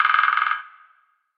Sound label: Woodpecker; kult